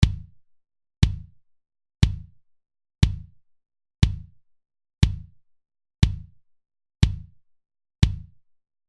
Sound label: Kick Drum